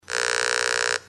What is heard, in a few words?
C64; Great Road Race